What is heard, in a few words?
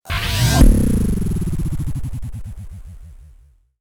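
Approach Distorted Bass Drop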